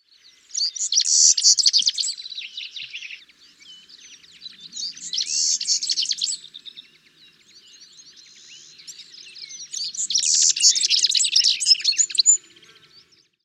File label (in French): orn venturon montagnard